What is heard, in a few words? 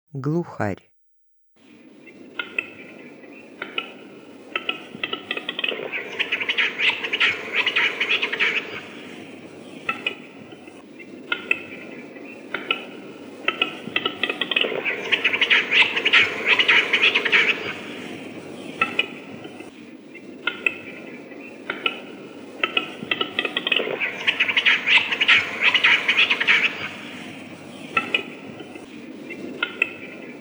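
capercaillie voice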